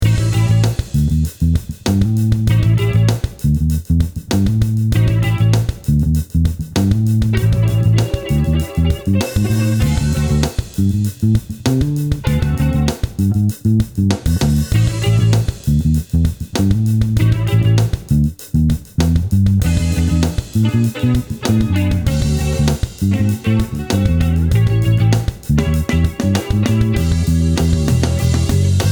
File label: funk Blues